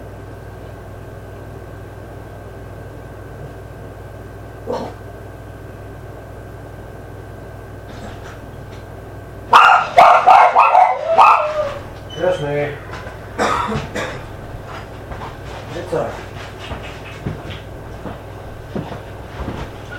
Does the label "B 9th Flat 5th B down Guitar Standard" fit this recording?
No